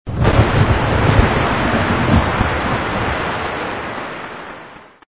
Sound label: thunder